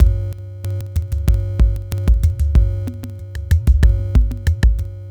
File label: Glitched, Processed Percussion